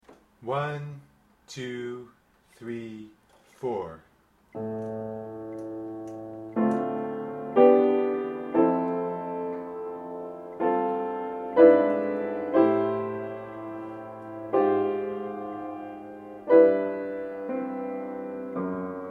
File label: Big Band Solo Backgrounds